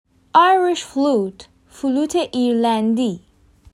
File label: Irish flute